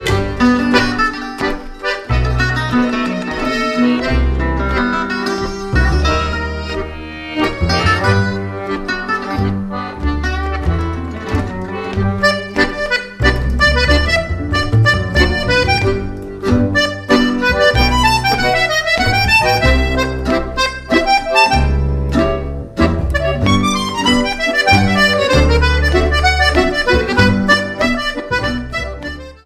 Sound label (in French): une valse anglaise